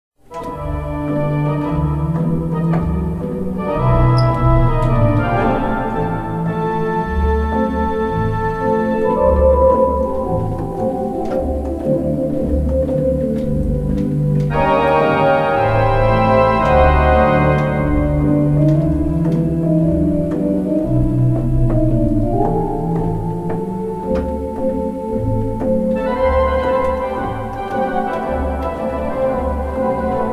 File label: hop scotch